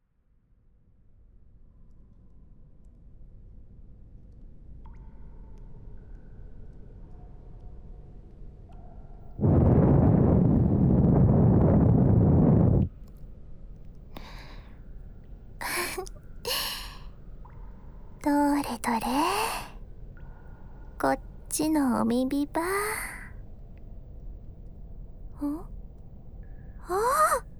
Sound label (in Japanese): 耳掃除（右耳）